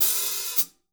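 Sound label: hat open close, drum; 90s sample CD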